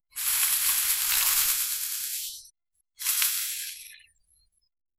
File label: magical garden tea table, butterfly